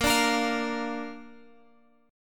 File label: A sharp Major, Guitar, Open G